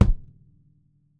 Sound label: CM Bass Drum Single short